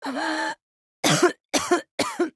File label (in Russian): Кашель; Симметра